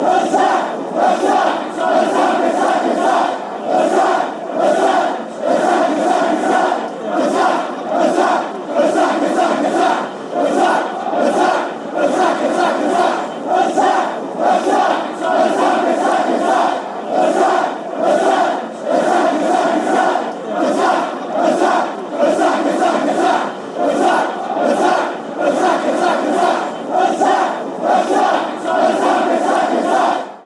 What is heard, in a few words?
attack attack fanchants; cfc